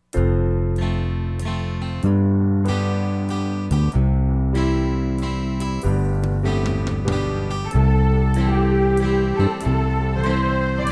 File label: Ballad Set